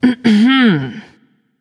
clear throat; Smite; athena

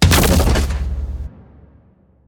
activate; smite; god raijin

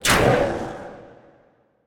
ghost; Smite; Zhong Kui

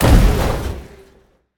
boar attack; smite; god artemis

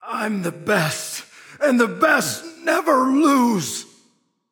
death; smite; voicepack; set

